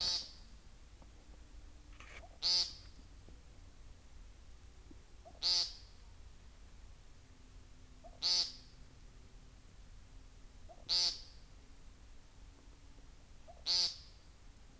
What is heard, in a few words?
american woodcock